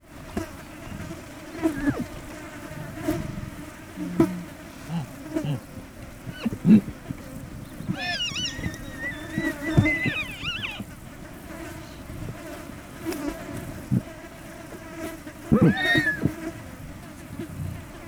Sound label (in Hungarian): hangoskodnak szél, vadló; Hortobágyi Nemzeti Park; pentazug